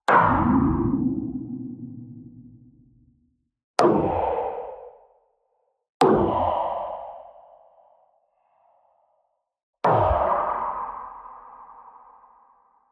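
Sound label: strange impact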